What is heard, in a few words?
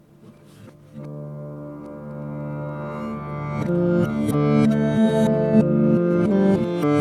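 Riff Reverse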